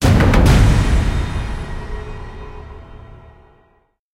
mission failed; War Thunder

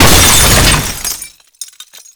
explosion television shot; Explosions

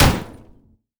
hit, damage; DCS

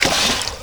water choke loop start

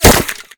impact masonry; Modern Warfare

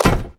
iron run

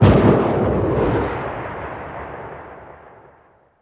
imperial rocket 1shot far

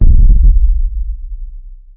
explosion rumble; War Thunder

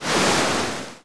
Ocean Spray; Civ4 warlords

